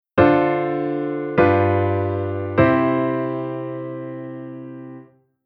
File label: Chord Sec Dominant Pattern